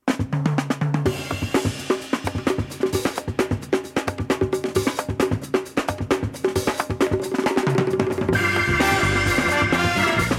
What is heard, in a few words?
All Day Long Instrumental